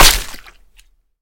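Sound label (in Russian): смачный ножевой удар по тренировочной мишени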